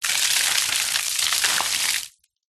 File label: nature water pour splatter concrete; zapsplat